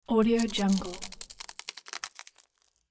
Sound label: slow bite into chips